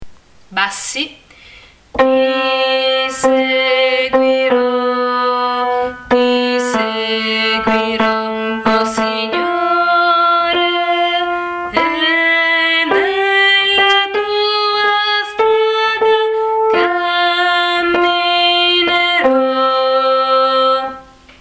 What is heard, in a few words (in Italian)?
Bassi; Ti seguiro